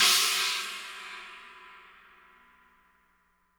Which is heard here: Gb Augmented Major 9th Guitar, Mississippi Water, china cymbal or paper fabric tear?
china cymbal